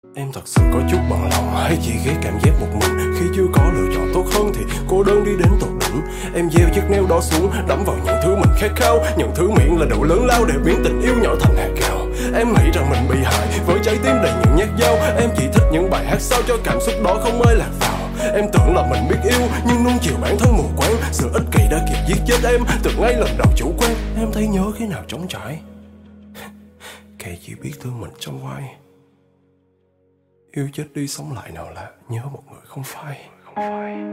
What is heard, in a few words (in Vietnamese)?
Nhac Chuong (Rap)